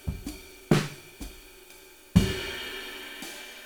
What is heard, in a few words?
Room A